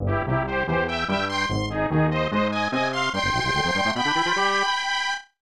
tada

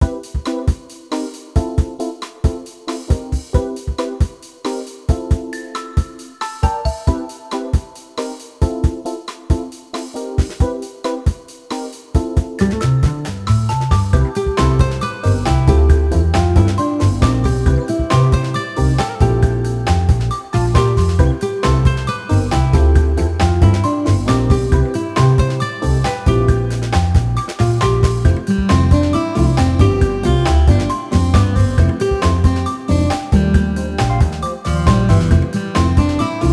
BGM Shop; petz beach countryside; nintendo ds

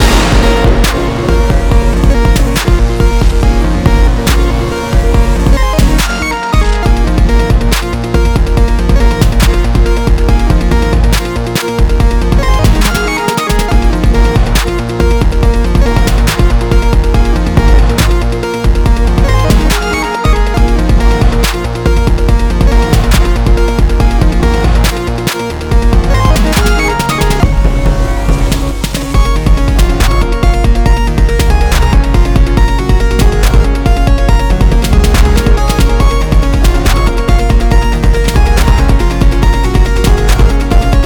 BOSS FIGHT MUZAC; high hell

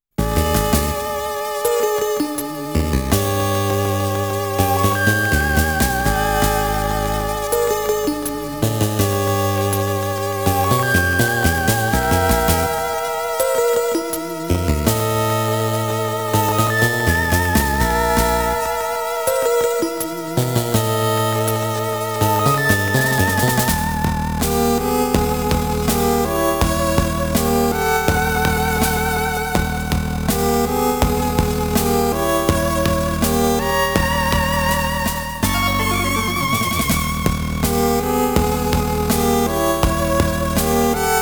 Alert Mode; IMMINENT

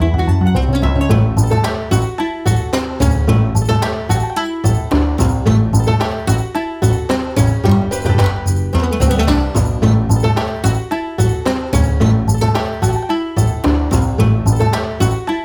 MAIN MENU Banjo LOOP; Hunahpu Way of the Warrior

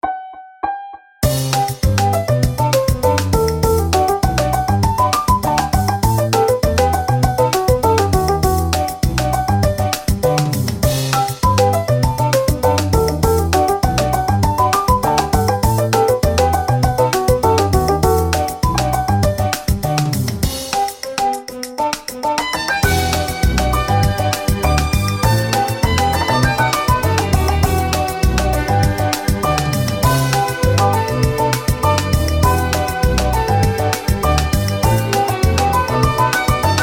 Bossa Lullaby; Regretevator

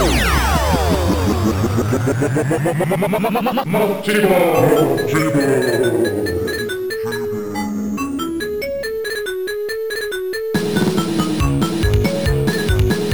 Spooky Multiball; Psycho Pinball; ms-dos

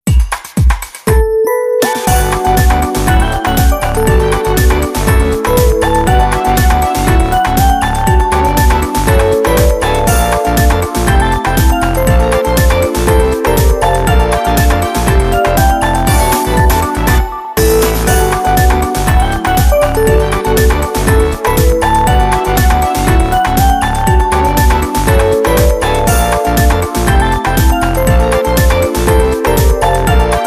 Music Menu event

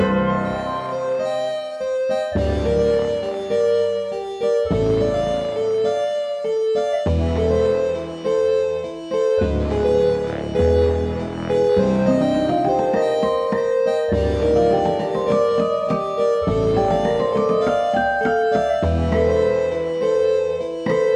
Claws Loop No drums theremin; jenny leclue detectiv

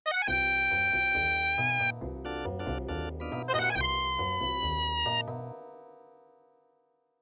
MIDI Animation stings

